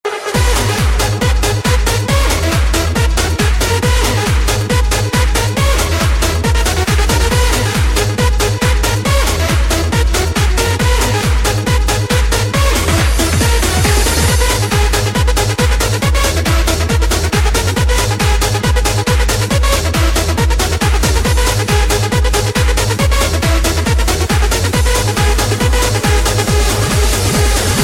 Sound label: Trance